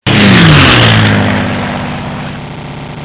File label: airplane